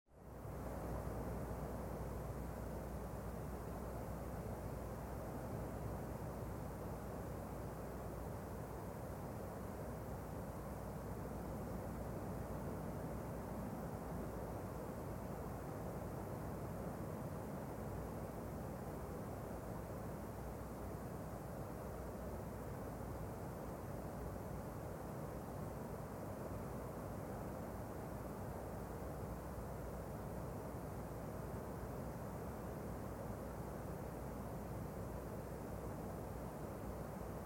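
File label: air, foliage; Звук ветра в лесу, звук ветра в листве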